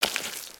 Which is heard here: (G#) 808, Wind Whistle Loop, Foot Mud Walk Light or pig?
Foot Mud Walk Light